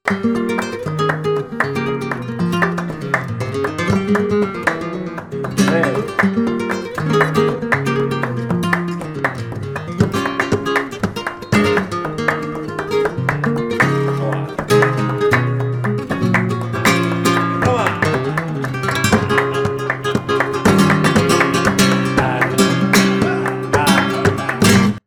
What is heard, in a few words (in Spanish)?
falseta, flamenco